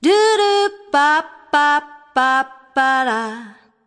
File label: 100 bpm Line; VOCAL OR VOXY LOOPS